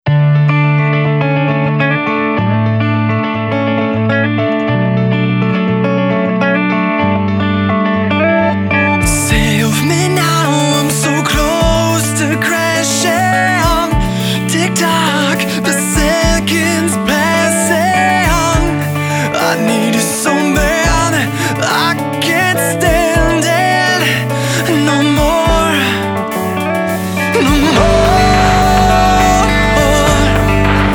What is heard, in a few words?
ROCK